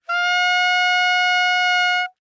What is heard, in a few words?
Bass Clarinet ff Gb5 stereo; Woodwinds